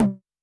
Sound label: Electro Kit Tom